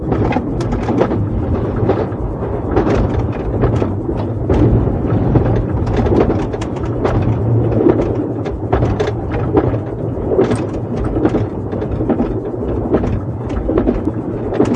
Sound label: Run, Train; OpenBVE